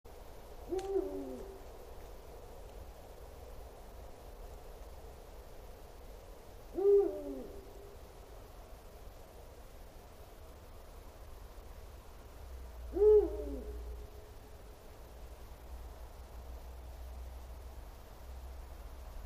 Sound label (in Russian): filin bubo bubo, zvuki nochnyh ptits